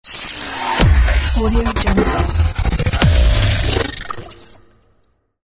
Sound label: distorted crazy electrica logo